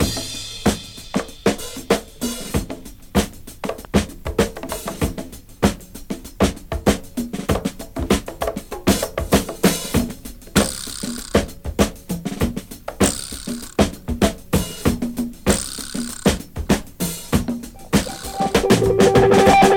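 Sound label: Hammond rif, Right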